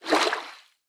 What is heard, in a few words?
Floor Sink; boomerang fu